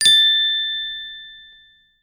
Pleased; FungusExamples; Sherlock

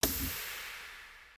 Menu Button

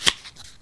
Card Draw; Udemy Card Battler

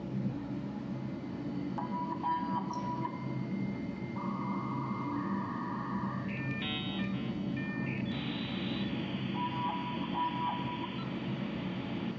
Ambience Tech; u3d guided alien shop

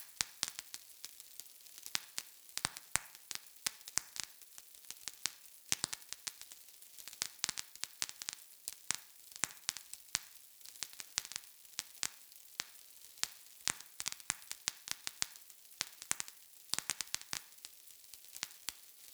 Fire Crackling

